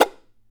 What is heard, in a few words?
CHARANGO FX